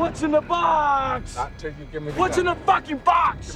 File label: box; Snatch